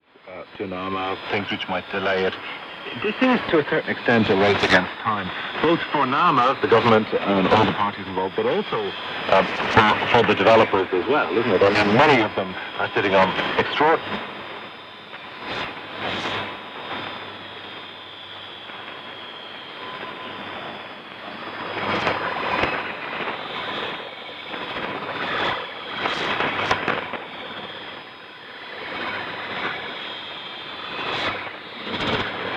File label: 6220 kHz in Veliky Novgorod; rte